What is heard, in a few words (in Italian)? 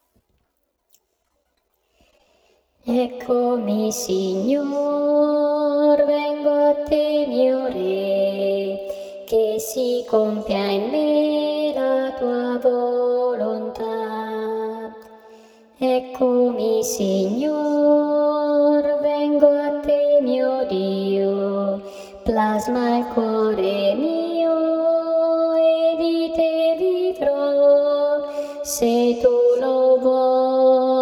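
contralti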